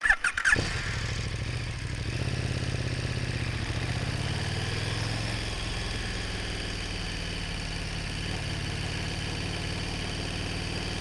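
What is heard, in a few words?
vehicle motorcycle ktm super duke r onboard driving sequence startup various constant rpms shutoff; engine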